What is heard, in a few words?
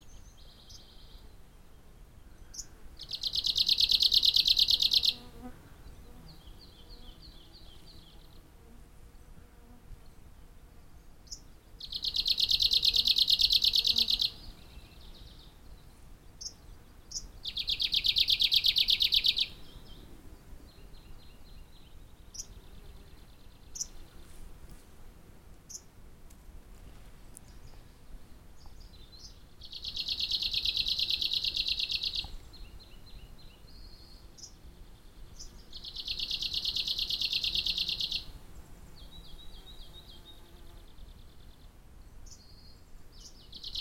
warbler arctic Phylloscopus borealis; Craig Brelsford